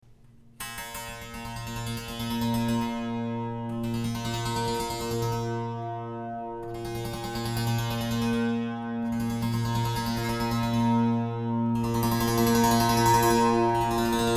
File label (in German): klangbeispiel monochord mono